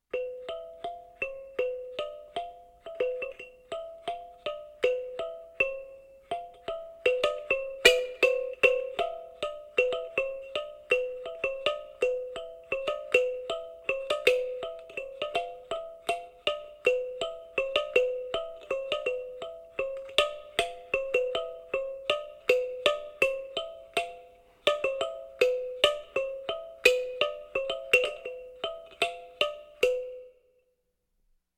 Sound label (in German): kalimba spielfigur; Suedsee